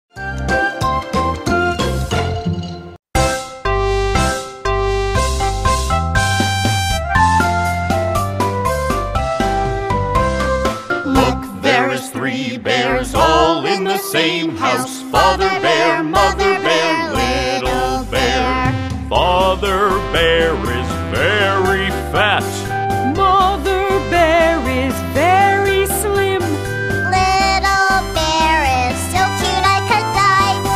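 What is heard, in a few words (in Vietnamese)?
nhạc khởi động thi; 1.nhac khoi dong thi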